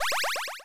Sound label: checkpoint; Ulmos Adventure; pygame